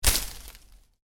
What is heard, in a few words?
Foliage Impact, Medium Foliage Hit; Impacts; Miscellaneous Impact